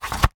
medieval seax place in sheath fast; still north media

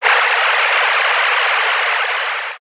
science fiction radio signal interference burst

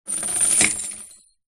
industrial chain rattle set down wood

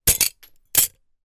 foley glass shard down on other broken shards

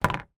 sport pool snooker ball pot plastic pocket

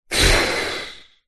human breathe out fast huff frustrated; zapsplat

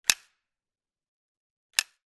gun carl gustav m45 swedish k; still north media

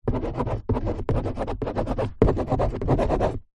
pen ball point writing empty card box; office

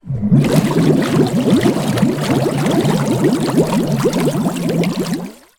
water bubbles large deep short burst; zapsplat

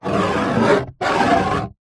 foley plastic smooth hollow sharp object scratch; zapsplat

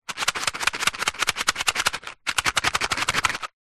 household matches box shake

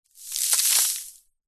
sand handful drop ground

